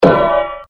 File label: pow; hl2dm; oppressive territory